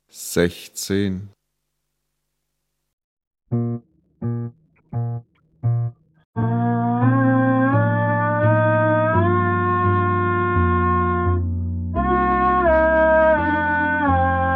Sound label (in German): Klang, Hornschule